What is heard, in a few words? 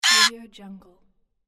mechanism